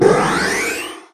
beam

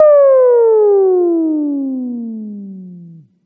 fall

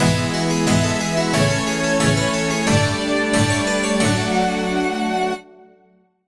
to pause, jingle

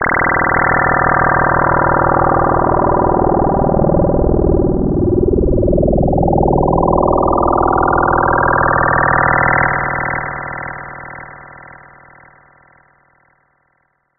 fly a spaceship

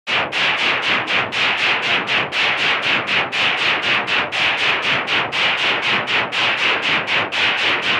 steam engine

